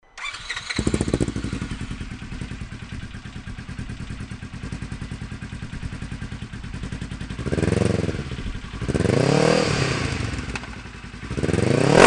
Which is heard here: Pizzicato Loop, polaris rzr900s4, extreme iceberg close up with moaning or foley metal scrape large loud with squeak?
polaris rzr900s4